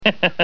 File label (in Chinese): 干笑; 笑声